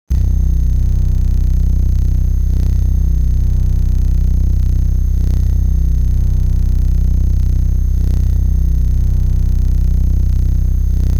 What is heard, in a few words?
crash